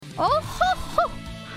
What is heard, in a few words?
ohoho